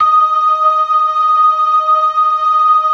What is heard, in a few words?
LO MANUAL VIB, Organ; InVision Interactive; Keith Emerson; The Most Dangerous Synth and Organ